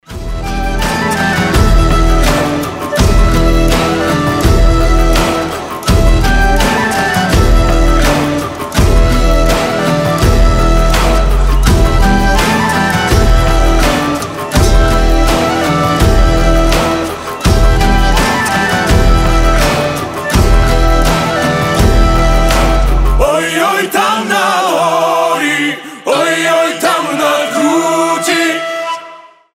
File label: acoustic version